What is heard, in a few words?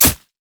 shen basicattack; LoL